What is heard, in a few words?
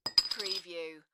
Beer Bottle Top Drop; beanstalk audio